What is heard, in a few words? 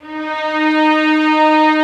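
Vio legato D#3; Digital Sound Factory Virtuoso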